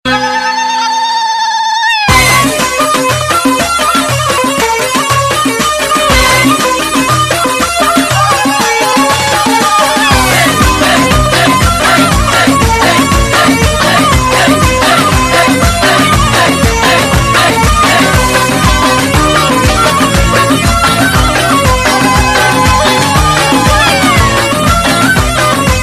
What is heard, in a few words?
arabic music ringtone